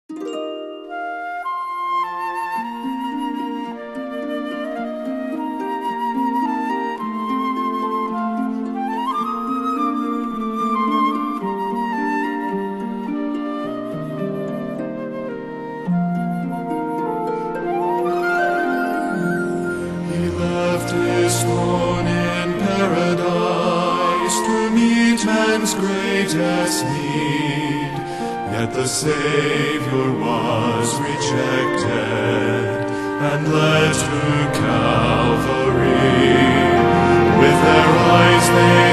TRIO

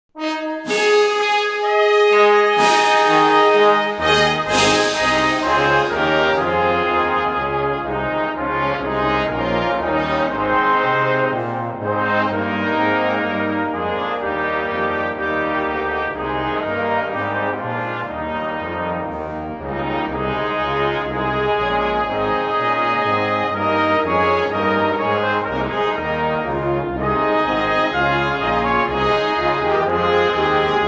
brass ensemble

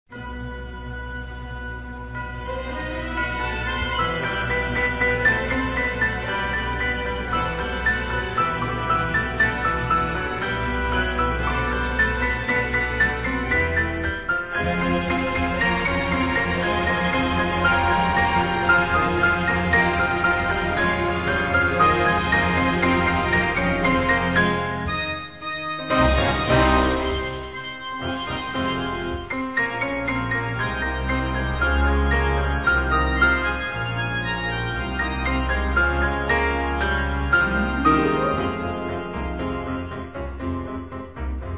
Strings, Christmas

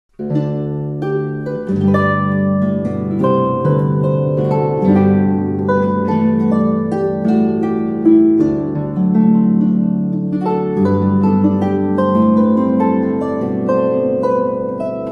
hark the glad sound, christmas carols on the harp

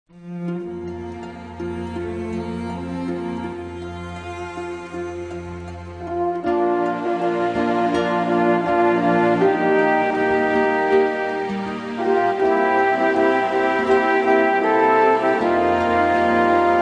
Orchestra, Strings

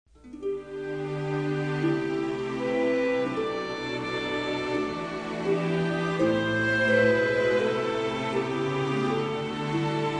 Orchestra, Strings; Majesty Orchestra

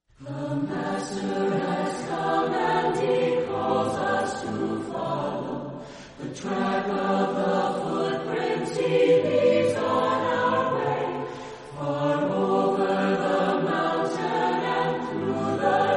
Chorale, Voices in Praise acappella

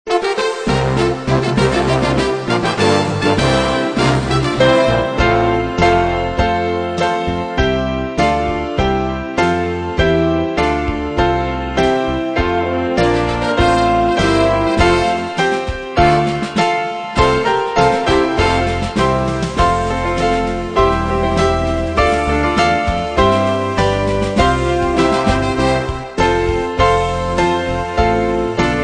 Instrumental Hymns

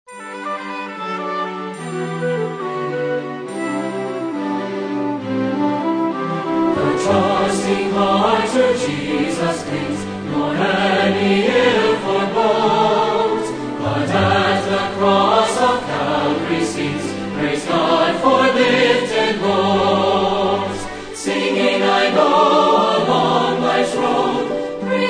Singers With Orchestra

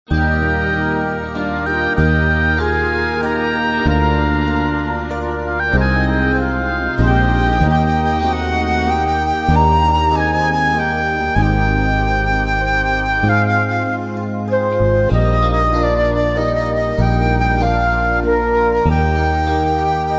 Instrumental Hymns

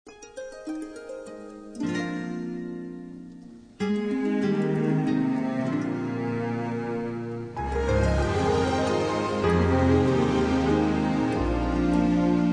Victorious Strings

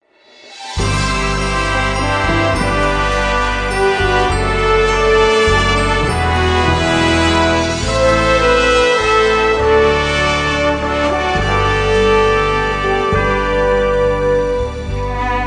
Instrumental Hymns

